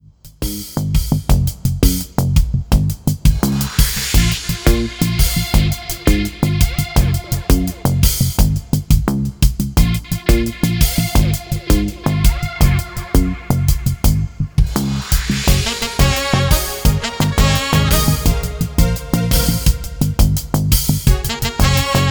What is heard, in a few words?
Horns Include Of Bb Minor